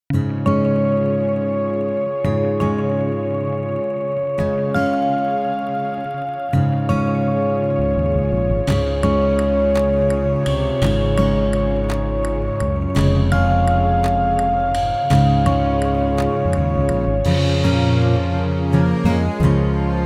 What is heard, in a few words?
Spiritual Guitars